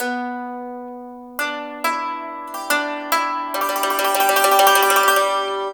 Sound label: YANG CHING, Heart of Asia; Spectrasonics